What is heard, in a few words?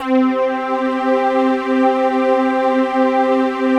PAD, ATMO PADS; Best Service; XX Large